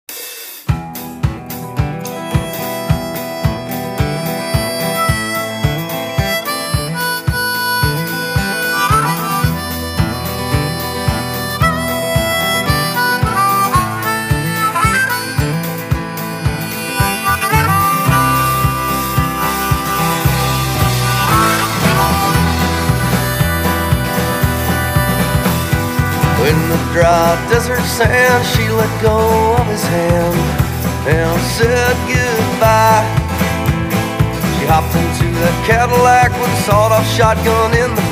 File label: desert gunfire